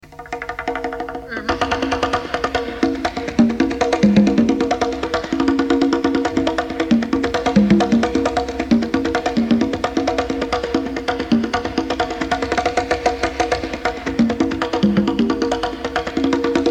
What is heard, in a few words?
Conga Solo